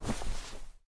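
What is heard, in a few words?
Movement Cloth; smite; god bakasura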